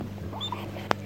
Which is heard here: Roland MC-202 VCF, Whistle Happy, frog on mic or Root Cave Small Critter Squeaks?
Whistle Happy